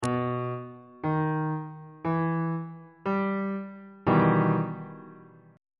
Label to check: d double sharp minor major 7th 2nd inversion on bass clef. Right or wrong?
Right